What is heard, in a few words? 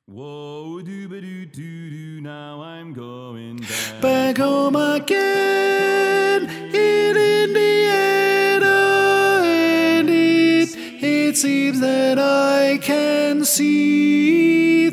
Tenor, quartet